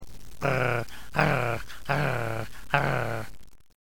Dog Growl

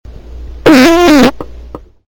fart

